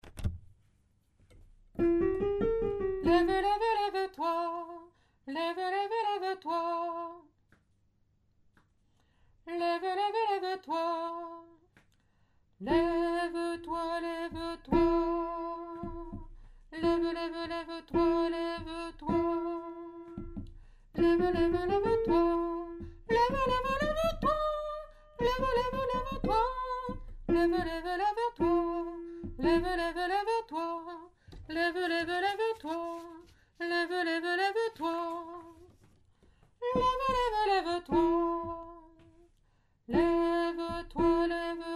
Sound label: alto; leve toi